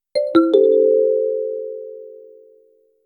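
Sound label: crisp elegant ios notification; marimba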